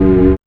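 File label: organ tone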